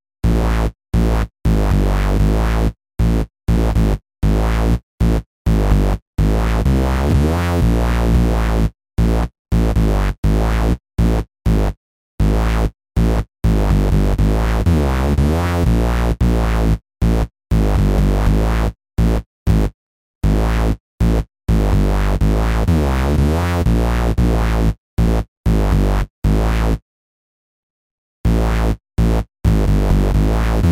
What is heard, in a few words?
dance portasynth, bass gr-55